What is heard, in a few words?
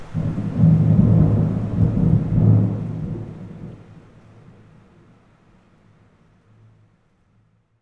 Thunder